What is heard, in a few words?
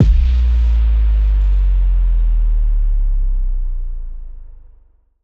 Sub Drop impact